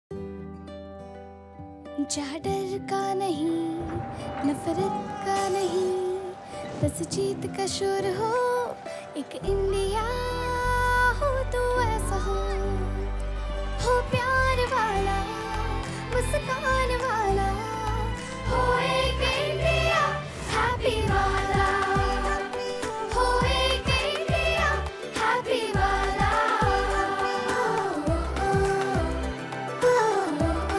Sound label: Female Version; Vivo Ipl TV Ads